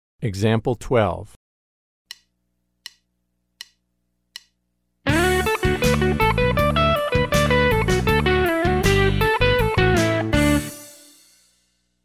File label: Country Rock